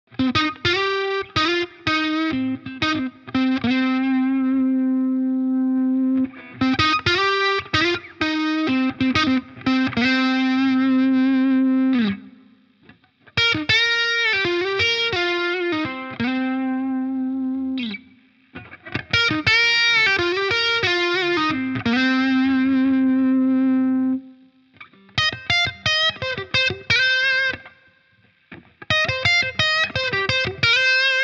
Reverend Trickshot verzerrt Hals Licks

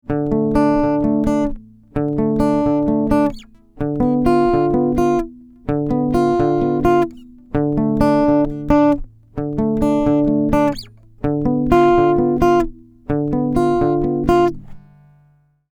Akku Git

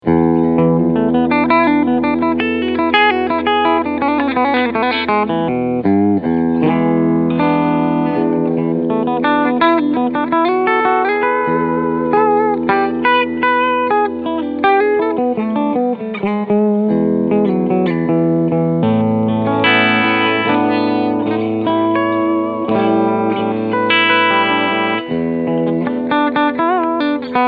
Steg PU Clean Picking

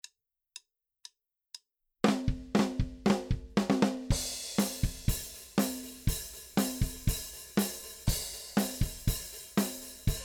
Drumbeat mit BD Mikro unbearbeitet

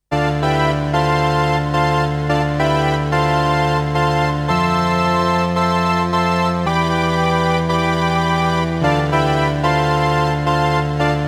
T-REX Replicator Module ohne Replicator

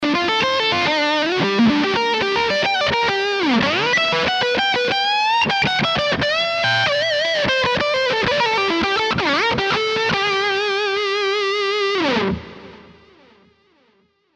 Les Paul 50s Steg Pickup Highgain Lead

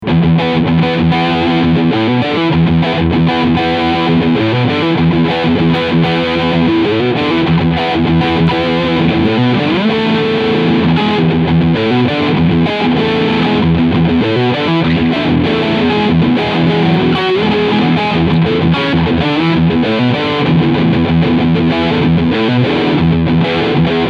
Harley Benton EX 76 Classic GHW AN Overdrive Riff Steg Pickup

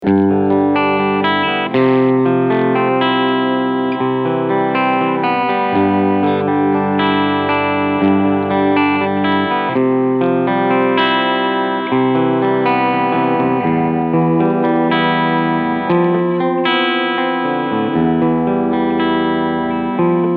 Cleansound Steg Humbucker

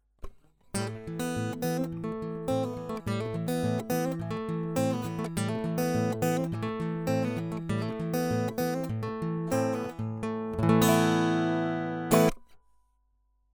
Akustik Git direkt

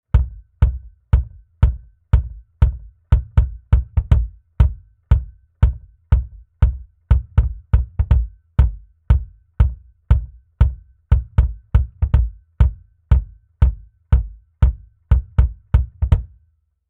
BD Variation mit Transformator; UnderToneAudio MPEQ-1

